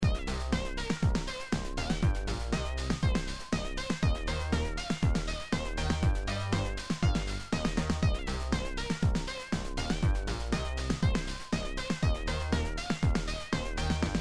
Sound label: bass basstone lead sound beat